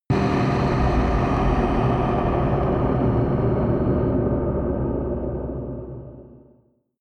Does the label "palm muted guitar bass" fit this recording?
No